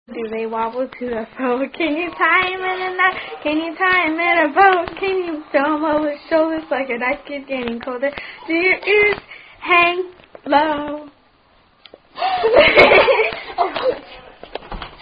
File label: Do Your Ears Hang Low [Tune Turkey In The Straw]